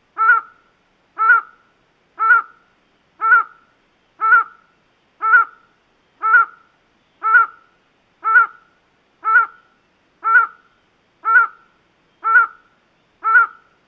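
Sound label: Highland Tinamou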